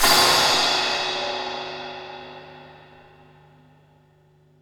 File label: FX Crash, Drums Acoustic; ALL CRASH; USB Soundscan vol.10; AKAI; 90s sample CD